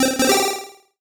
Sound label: Solo Chip C64 Positive; MUSIC EFFECT; startide